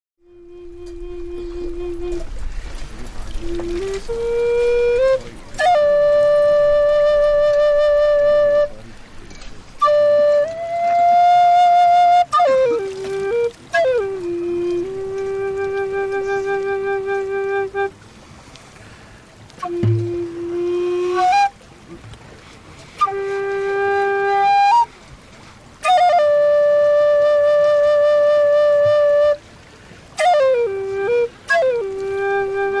flute, Music From The Campfires; arizona98

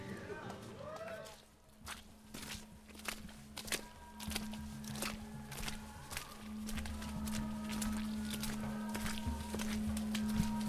Crystal Bowl Chant; wva 2005

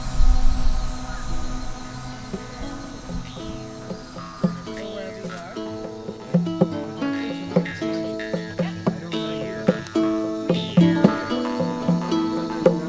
Instrumental De Harpa; mexico 2005

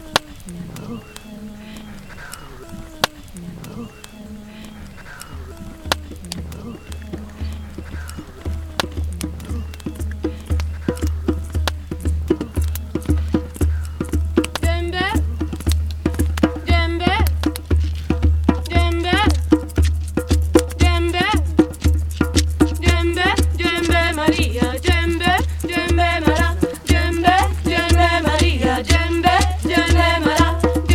djembe chant; california 2004

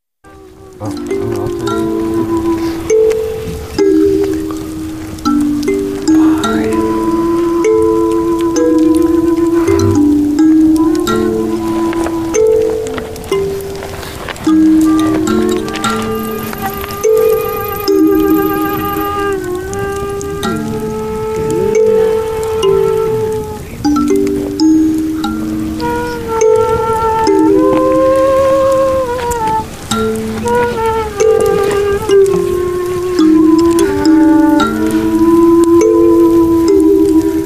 Thumb Piano And Flute

